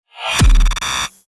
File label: Glitch Transition